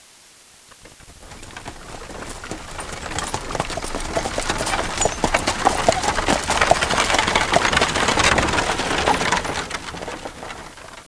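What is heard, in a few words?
samba car; transportation; ambient; InventorLabs - Transportation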